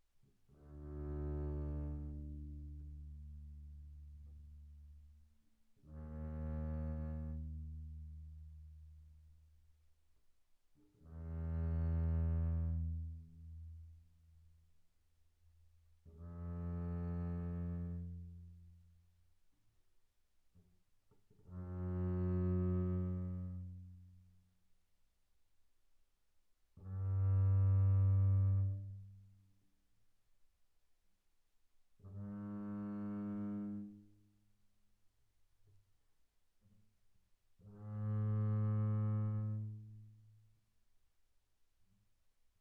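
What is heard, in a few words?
Bass arco sul D pianissimo D2 Bb2, double bass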